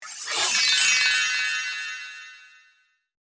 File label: Magical sparkle transition; shorts sound effects